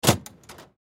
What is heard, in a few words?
Door Gym Open; Miscellaneous Doors; SoundEffects-Blastwave; Sports